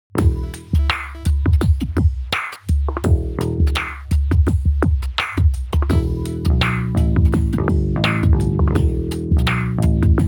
direct bass; karma

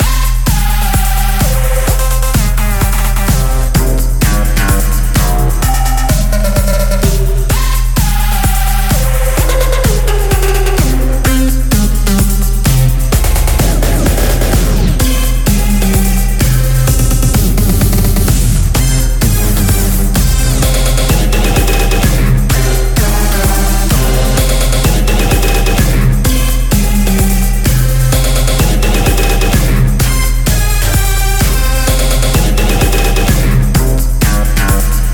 EDM riff

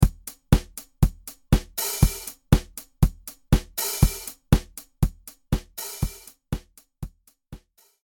ska

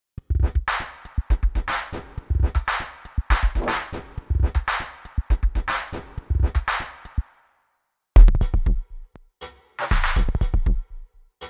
randomizing kits

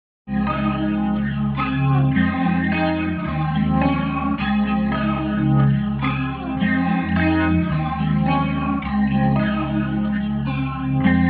sonic bubbler guitar wet

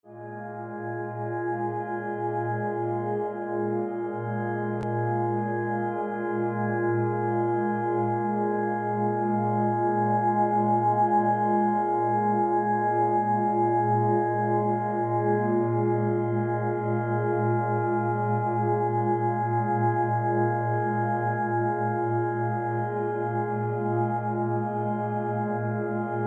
dreamscapes

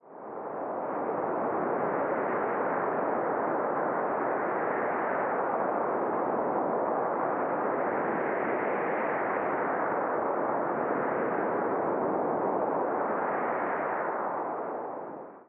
desert dunes

